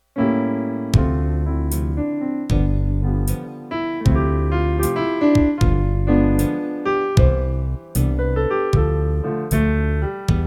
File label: Pianist